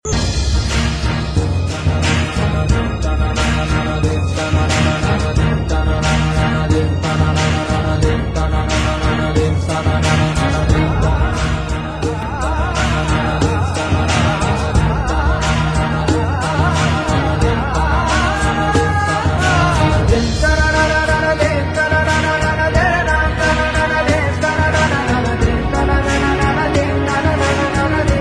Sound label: Music; meloboom